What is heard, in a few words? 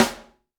Snare, Roland TD-20